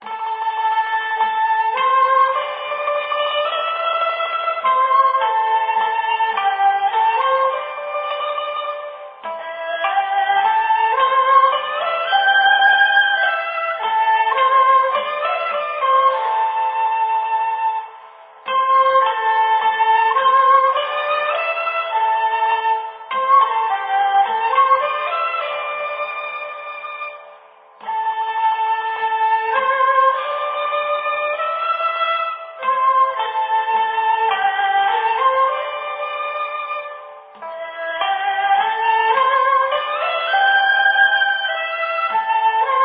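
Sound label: Music Ambient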